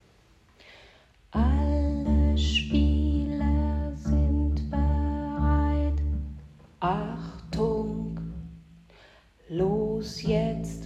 Alle fuer Zwei pizzicato Bass; gezupft

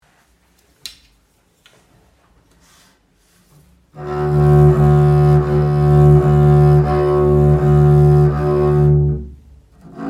Bass arco; gestrichen

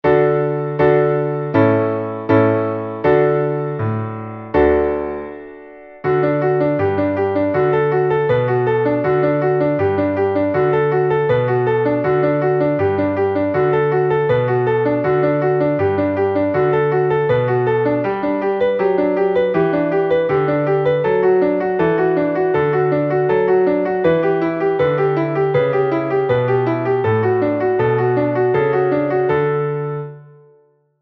schnell Klavier

